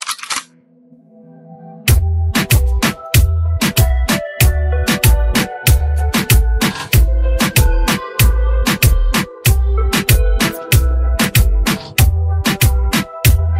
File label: Instrumental